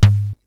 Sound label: Mid Tom, Elka Drumstar-80 drum machine; 300 Drum Machines